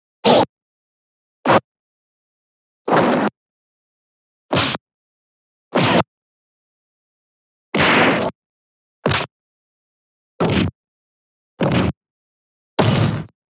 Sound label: hit; Super Street Fighter II